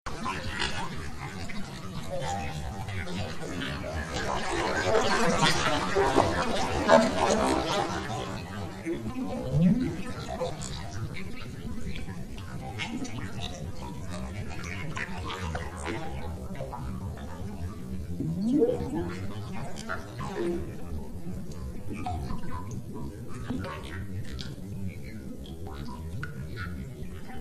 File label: Alien Transmission